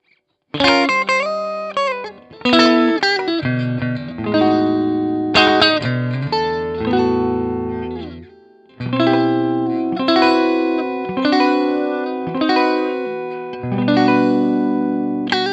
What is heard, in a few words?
impro clean caster, ampli lampe